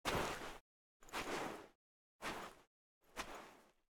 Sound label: footstep away sand